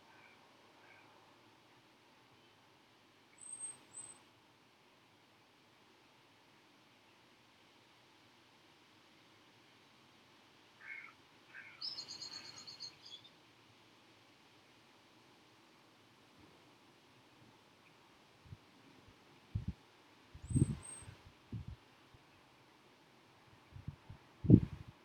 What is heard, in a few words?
back garden ambient